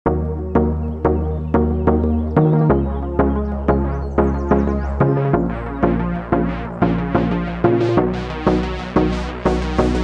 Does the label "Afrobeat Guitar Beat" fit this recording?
Yes